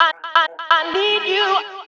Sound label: House Loops, Vocal Loops House 128BPM